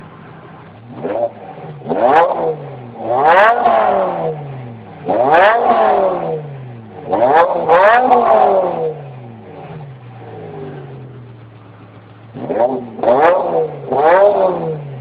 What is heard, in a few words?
Exhaust On Bmw E60 M